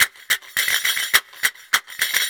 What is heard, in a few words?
Mizmah, Percussion; Sonic Foundry (Sony Creative Software); World Pop